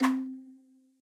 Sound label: point, game farkle